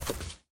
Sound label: Open Box